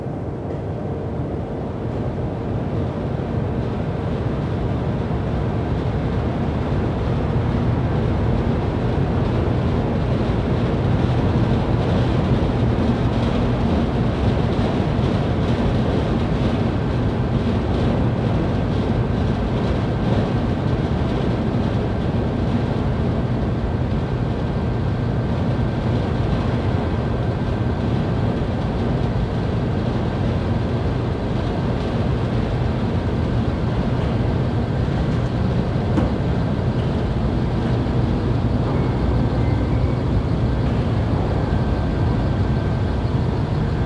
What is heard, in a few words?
steelmill ambience